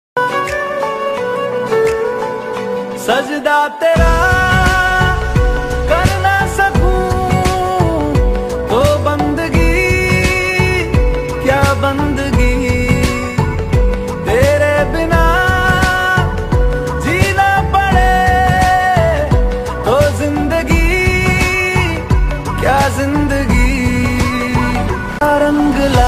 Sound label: New Bollywood Song Ringtone